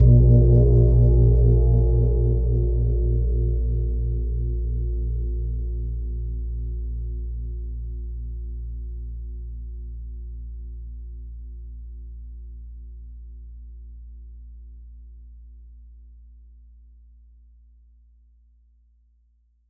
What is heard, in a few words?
Gong